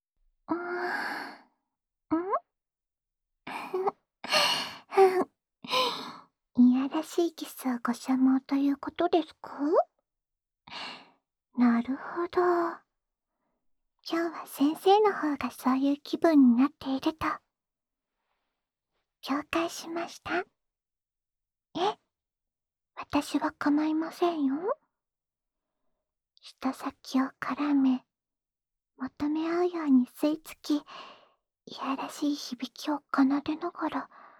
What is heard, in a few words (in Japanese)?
癒やされ用ディープキスループ